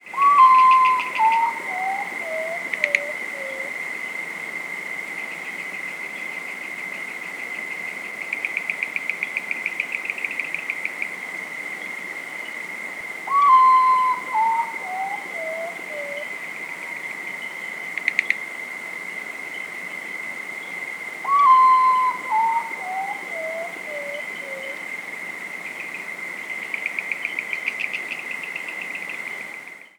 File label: Nyctibius griseus